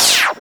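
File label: LASER STOP LONG, TAPESTOPS; Drums In Your FACE!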